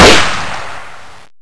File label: ap9 fire